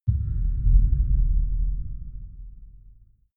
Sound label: far outer space shadow, hits impacts